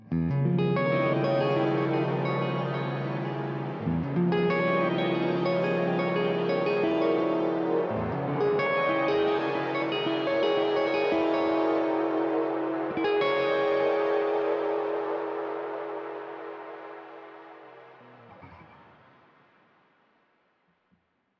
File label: Reverb Shimmer